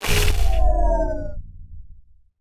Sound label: Remove Heart Ball; TechTree